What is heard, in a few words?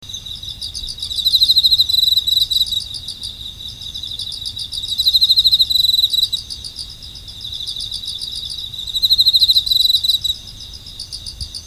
chirp